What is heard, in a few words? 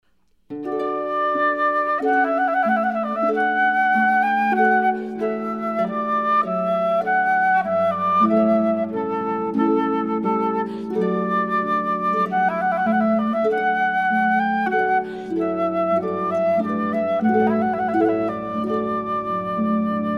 harp